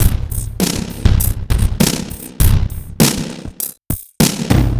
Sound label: Noise Beat; Drums & Drones